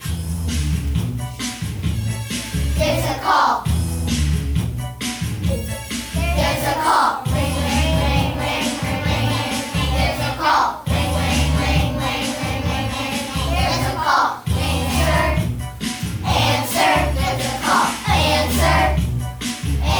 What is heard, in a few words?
ringtone